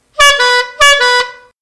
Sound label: Horn Too Doo Clown